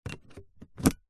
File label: car 12 volt usb convertor plug in; vehicles; zapsplat